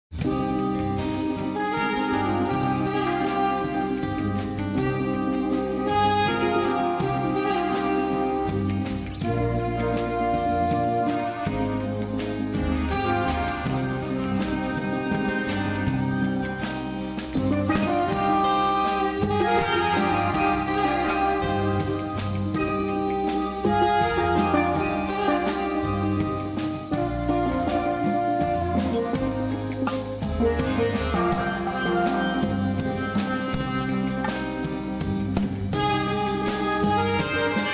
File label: flamenco